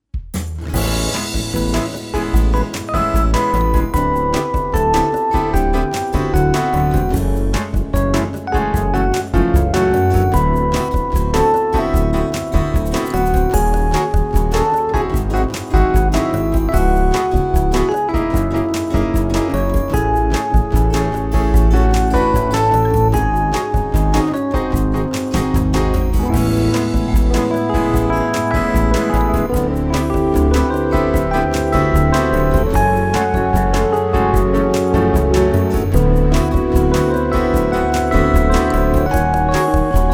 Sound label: Jazz Latin; Jazzlatin leadertje